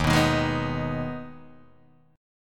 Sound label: Eb Suspended 4th Sharp 5th, Guitar DADGAD; 1,2,1,1,2,1